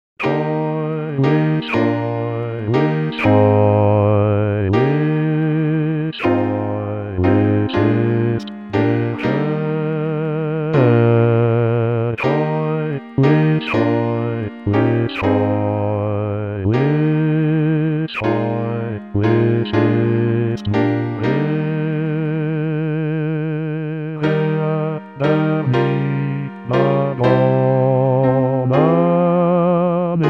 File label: bass; HEILIG